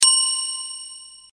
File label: Simple Sms, SMS Tone